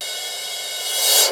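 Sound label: cymbal crash reverse; 300 Drum Machines; Yamaha Motif ES-Series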